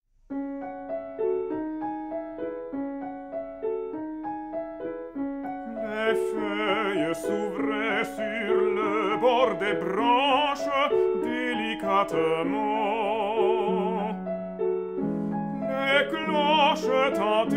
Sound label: Les Cloches